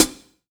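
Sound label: hi-hat 70s, drum kit; E-Lab; X Static Goldmine 2; akai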